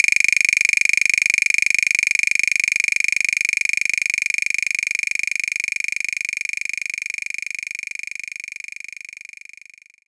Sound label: Wood Block Med